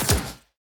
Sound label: basic Attack cast; heroes onagro